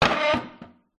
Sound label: pub wooden chair movement on tile floor; field reports